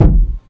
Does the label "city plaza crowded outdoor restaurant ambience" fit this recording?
No